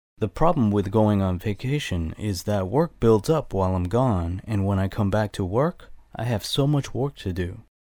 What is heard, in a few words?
build up